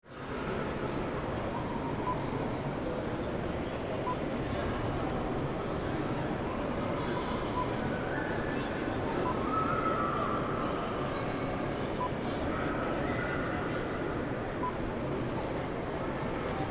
Turbine Hall London Gallery; Tate Modern

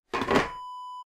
water scoop set down

